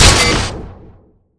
radio gun shoot; tf2

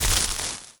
welder hit world; tf2

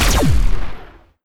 gauss pistol shoot; tf2

